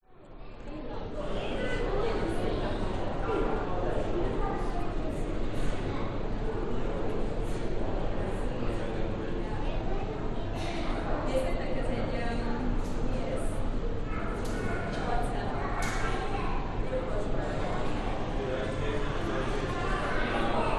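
public places museum busy parents children internal brisbane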